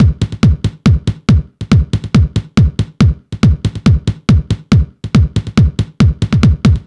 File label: Kick, Trance, Drums